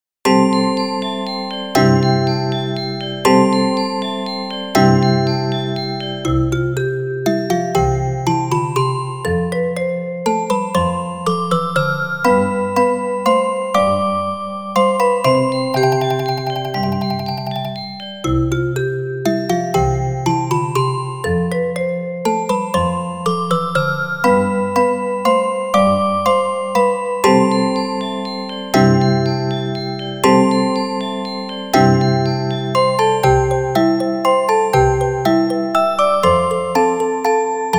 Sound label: marimba; christmas pop